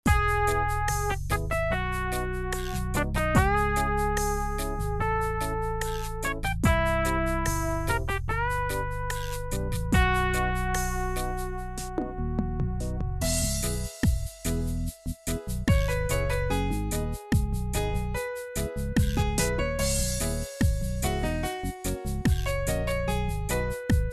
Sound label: Reggae